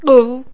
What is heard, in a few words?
drown